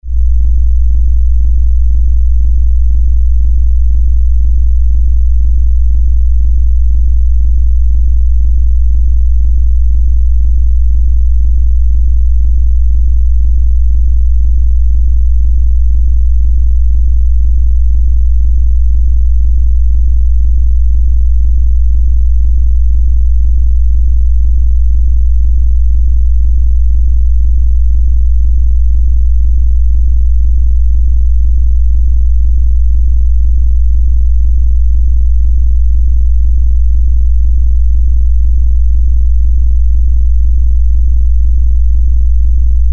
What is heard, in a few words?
Flu Chest Cold Cough